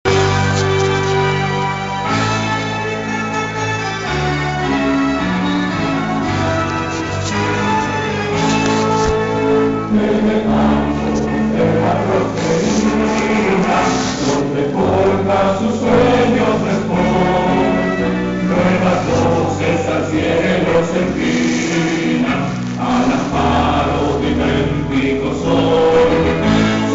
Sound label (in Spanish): himno al colegio politecnico; salida de los bachilleres; letra luis garcia alvarado